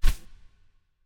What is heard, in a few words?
wing single; smite; khepri